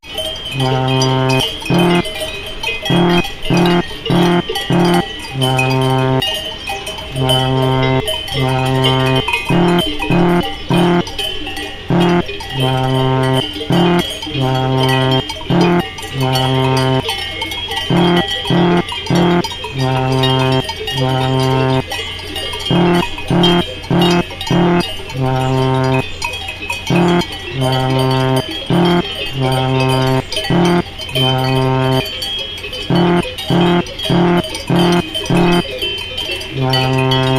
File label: alphorn